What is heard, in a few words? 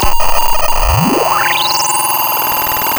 pop, Sound of a cat meowing